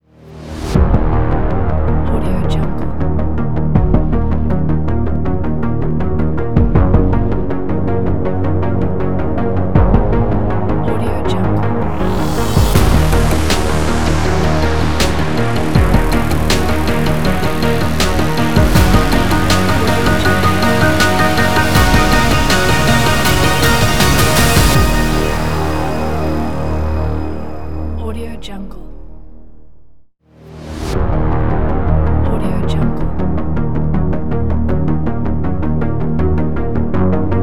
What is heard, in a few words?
80s scifi tension trailer ident